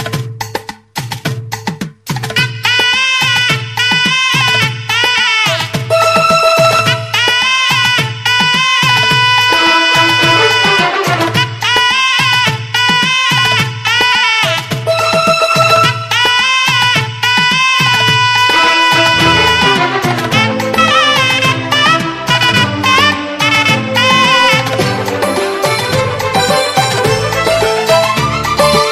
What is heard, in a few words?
bgm ringtone